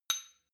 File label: shot glass clink medium, gastronomie; berufe; soundpool